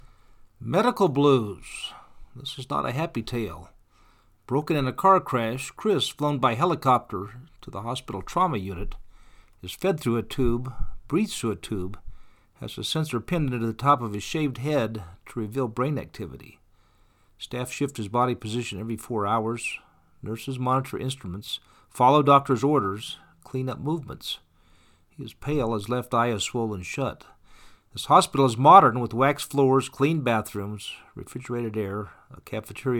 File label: blues